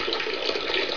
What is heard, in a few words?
pee; dod